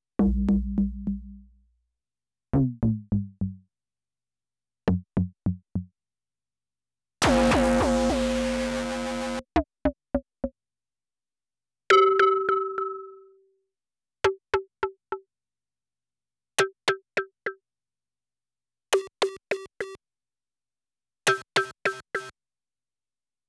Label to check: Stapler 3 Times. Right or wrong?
Wrong